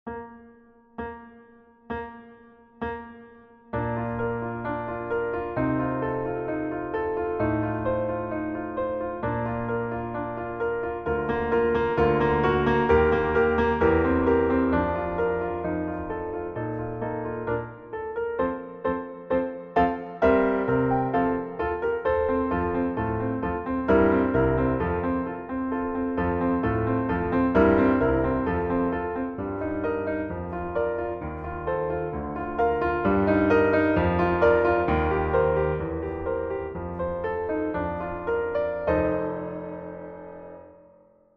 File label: Playback Piano; Beethoven; Liebeslied